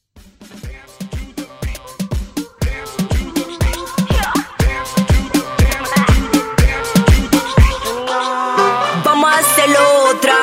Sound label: Latin Redrum; Remixfreakz